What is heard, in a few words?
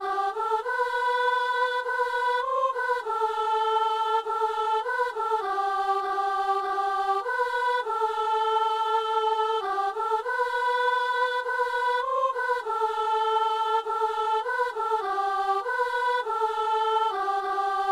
soprano; Aussitôt que la lumière; Messe du 17 janvier à Saint-Denis